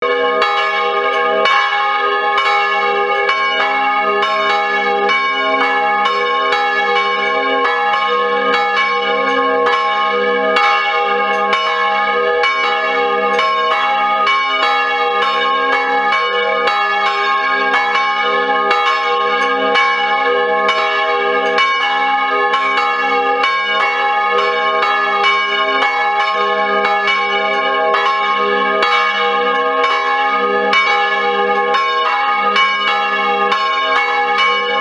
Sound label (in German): Glocke III I